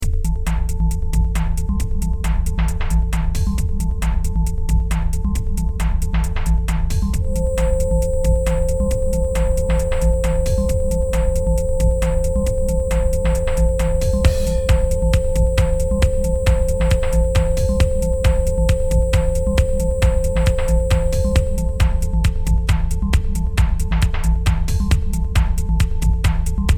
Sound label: ringtone